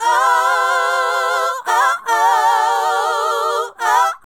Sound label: Female Group, Hip-Hop and RnB Vocals